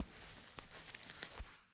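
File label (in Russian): неприятное короткое шуршание пакетиками от еды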